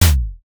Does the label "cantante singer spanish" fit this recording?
No